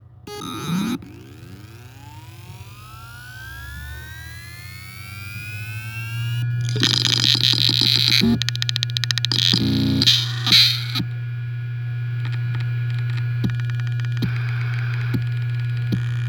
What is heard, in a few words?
Spinup, Seagate EXOS ST10000NM001G; HDD